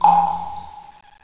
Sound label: drip